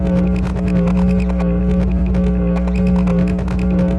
fly loop; hl2

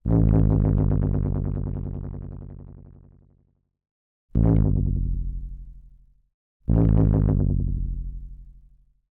Crunchy Bass Dive Dissonant, ARP 2600; Bolt; ARP 2600- Droids, Blips, Drones & more

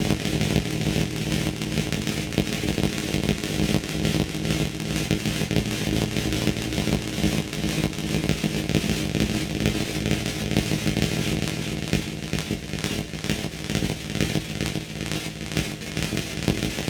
Light Machinery; Background Machinery; Julian Ray

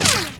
bullet L to R, nearmiss; weapons

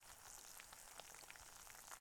light frying loop; 3maze; The Kitchen

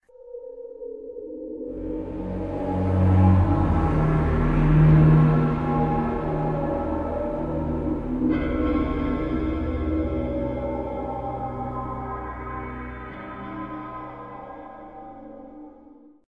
stinger song, music stingers; hl2

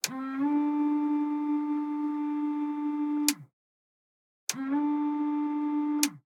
Renault R4 GTL Windshield Washer Interior; Stereo; Justsoundeffects

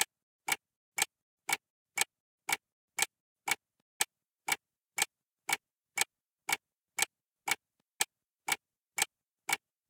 Casual clock timer 10s; Casual Games

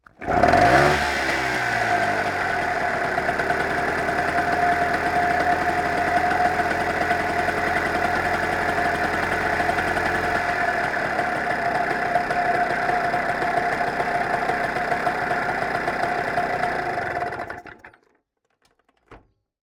Tunturi On Board Startup Idle Shutdown Engine; Soundreorganized; Tunturi Maxi Sound Effects Library

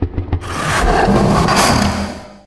hydra alert; hl2

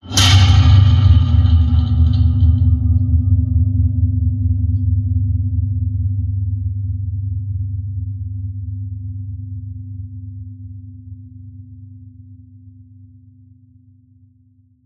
Metal Shelf Hit; The Metal Shelf

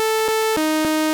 bullet L to R test; hl2

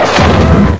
houndeye blast; hl2

